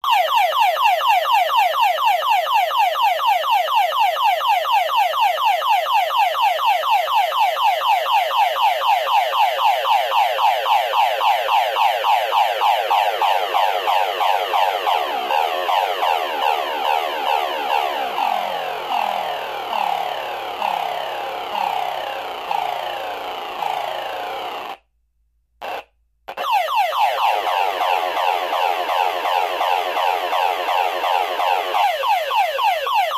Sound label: Alarm Car Type 5; Modulating Whopper Tone Slows Down And Speeds Up, Dies At End